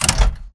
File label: Mvmt FS Walk Default; AI Stalker; Titanfall 2